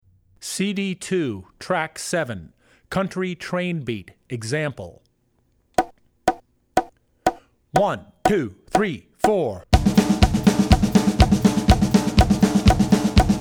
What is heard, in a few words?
Country - Train Beat; CD2 Track7 Country Train Beat; Drummer's Bible Tracks